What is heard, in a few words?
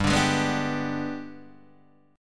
G sharp Minor Major 7th Flat 5th